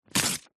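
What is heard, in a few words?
household instructions pamphlet glossy paper rip; zapsplat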